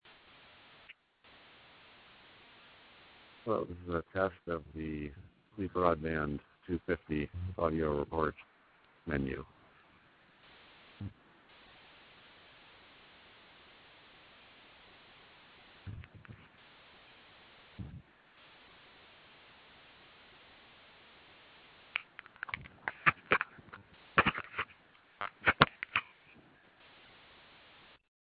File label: grasshopper